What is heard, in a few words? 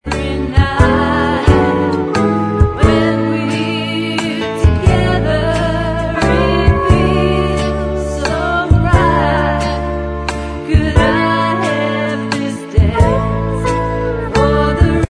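backing vocals, backing track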